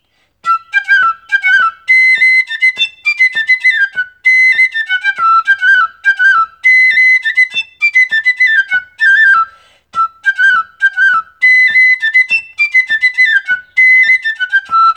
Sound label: Scottish lo banouflet